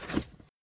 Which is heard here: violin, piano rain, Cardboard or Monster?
Cardboard